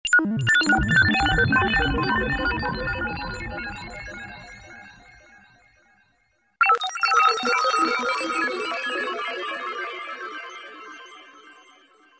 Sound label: Bleep Sound